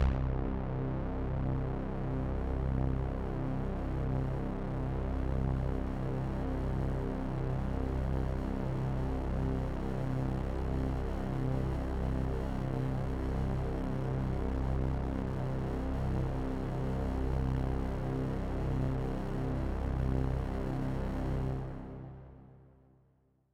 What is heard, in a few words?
Music Care; Void Bastards